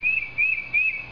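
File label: tufted titmouse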